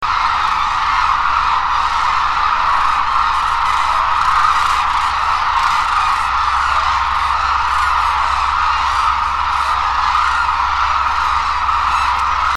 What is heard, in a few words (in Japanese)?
nabeduru tobitachi, izumi